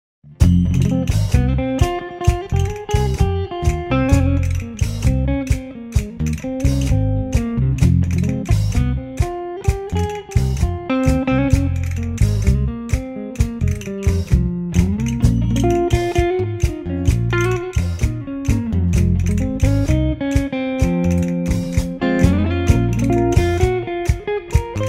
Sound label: Tango Tango